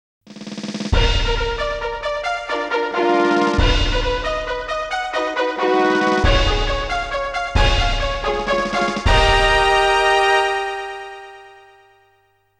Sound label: Fanfare - General Race; koei original bgm collection vol.13; winning post; winning post 2